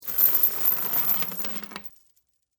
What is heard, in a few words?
Chains Wood Box, Industry, Chains, Raise, From, Wood, Box; Blast Wave